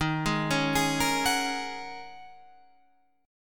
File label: Ab 7th Suspended 2nd Suspended 4th, chord